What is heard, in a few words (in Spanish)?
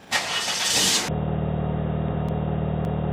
encendido, vehicle; vehicle mod